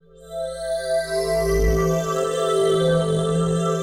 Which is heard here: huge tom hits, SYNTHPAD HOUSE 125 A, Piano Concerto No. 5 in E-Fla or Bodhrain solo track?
SYNTHPAD HOUSE 125 A